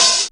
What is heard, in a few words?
Hat, Programmed Stepz, drum loop hits; Zero-G Jungle Warfare 1, 2 & 3; Percussion Hits